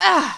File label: pain, player female